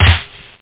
snare; OctaMED SoundStudio V1; drumkit

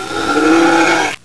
empty recycle bin

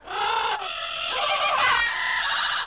parrot; Jump Zampoli

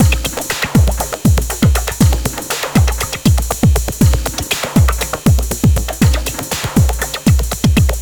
Techno Jazz - kick; Cyclotronic Resonator; Sonic Foundry ACID Music

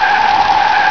ski; NewSurfs

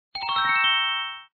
bonus; fruitselect

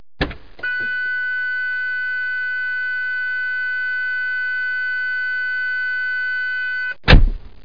entr car

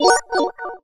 bonus; Geschicklichkeit; AquaBubble2

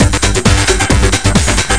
Hyped Loop; Guitar&Electro

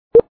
COUNTDOWN

